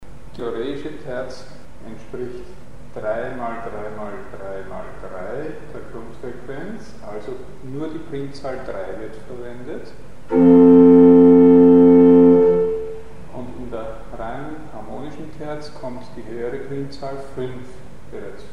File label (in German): Schwebungsfreie Terz 4 zu 5 vs Terz 64 zu 81; Mikrobuch